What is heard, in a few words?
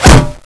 speargun hit